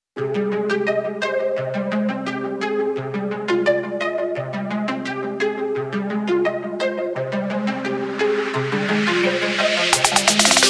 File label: Drama No Vox; hiphop